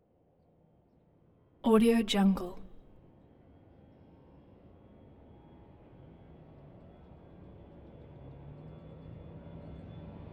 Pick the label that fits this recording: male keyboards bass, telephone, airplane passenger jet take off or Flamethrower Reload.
airplane passenger jet take off